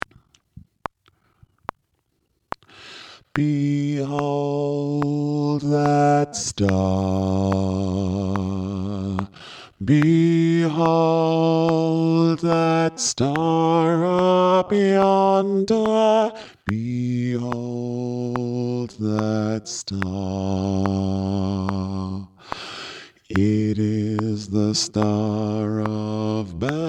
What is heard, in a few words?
behold that star Bass